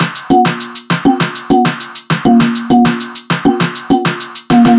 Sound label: Reggaeton Chorus